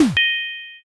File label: defeat